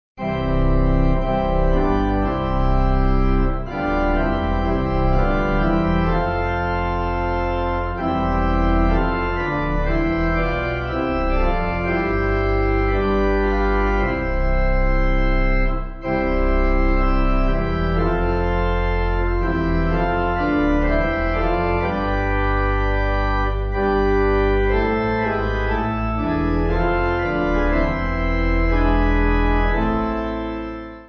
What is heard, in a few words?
Pipe; Christians Awake